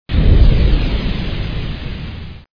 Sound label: Zoom; HOLIDAY; Vroom